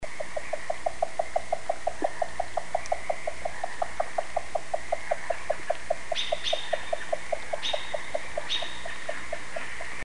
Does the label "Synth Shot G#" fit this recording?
No